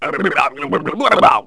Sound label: gargle; CD Mania